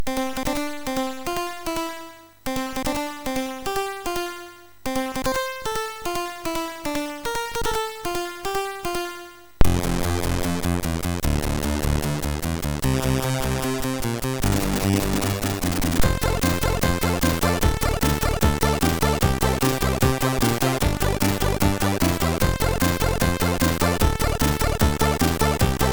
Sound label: Happy Birthday, MOS6581R3